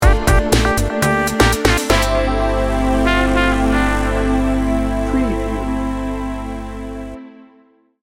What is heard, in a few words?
smooth jazzy laid back sting bumper